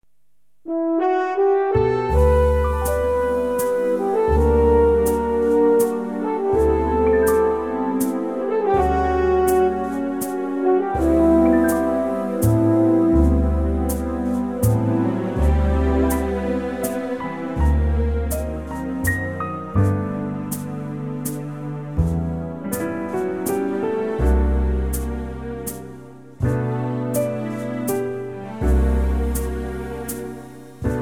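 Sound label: G waltz, Backing Tracks for Male Vocals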